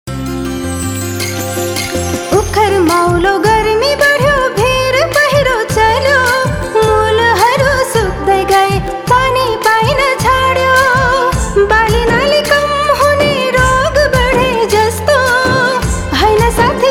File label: Jingle; ASHA